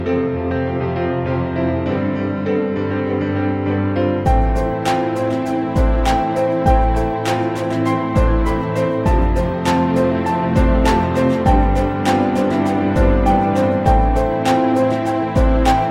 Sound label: best piano